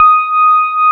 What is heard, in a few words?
DX PIANO; East West; Twisted Textures-BT